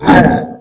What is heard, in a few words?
damage, Monster; yakking